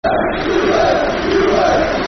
Bluebirds Football Chants (Soccer Songs), Cardiff City; fanchants-com